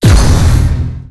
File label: tachyon lance hit; weapons; Stellaris